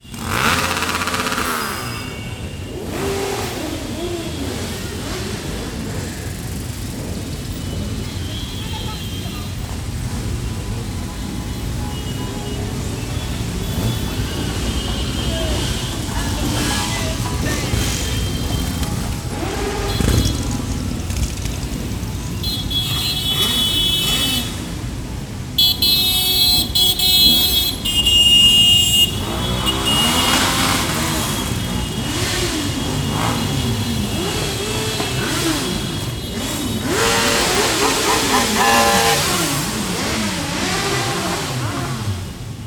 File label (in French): vroum vroum